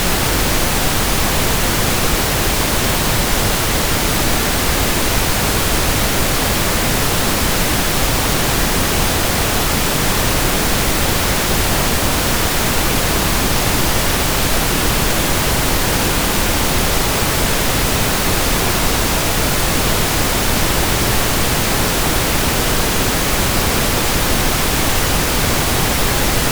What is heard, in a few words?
pink -3dBFS, audio tones